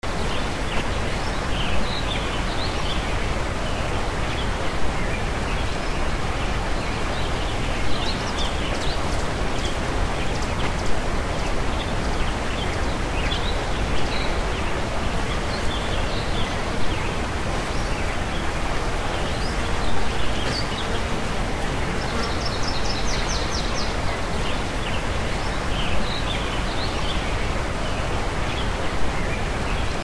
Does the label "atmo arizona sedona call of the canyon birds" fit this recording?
Yes